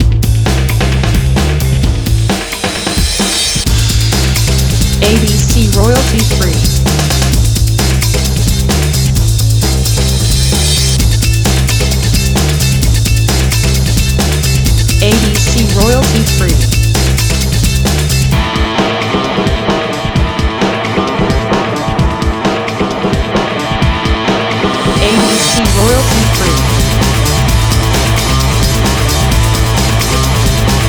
Action Breakbeat

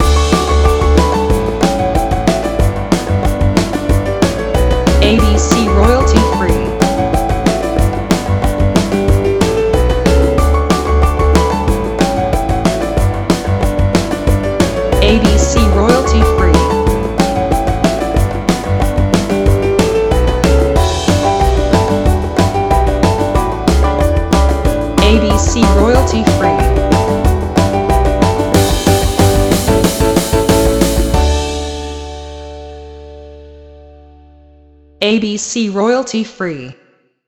Happy Piano Sitcom

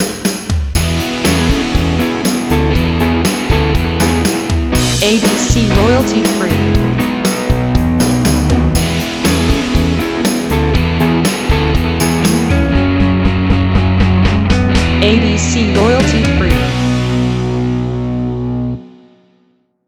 Cool Indie Rock

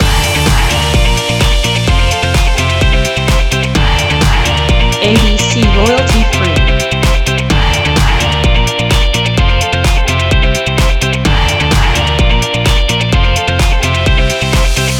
hey loop

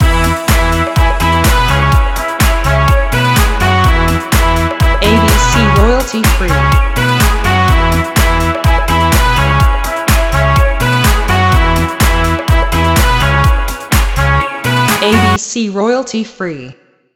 pop summer loop

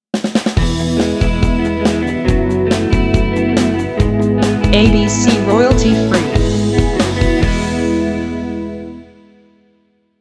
PopRock Logo

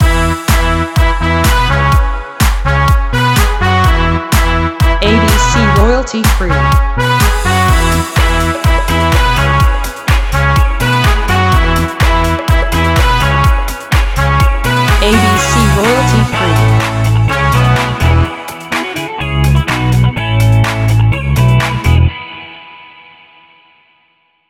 Pop Summer

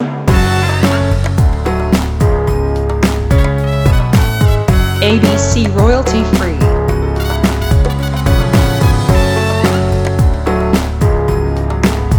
Modern Latino

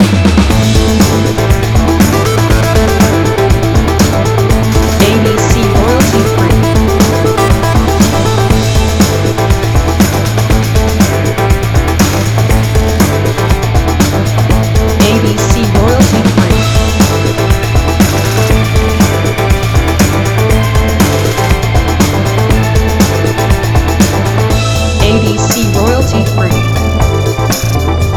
Electronic Rock Action

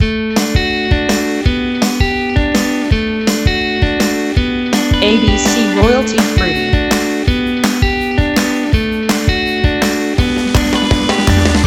Happy Commercial

